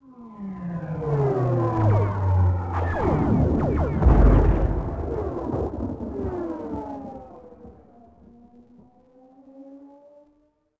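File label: squad distant battle; Star Wars Obi-Wan; XBOX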